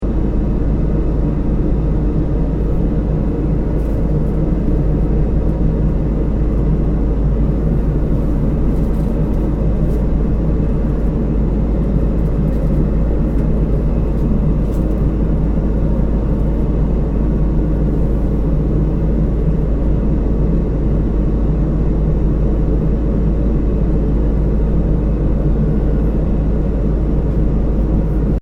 1990 BOEING 767-300ER CRUISING HEIGHT INTERIOR TOILET AMBIENCE LOOP